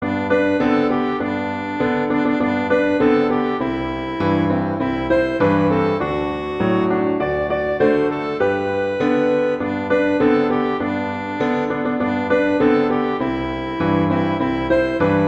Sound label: Jingle Bells, Christmas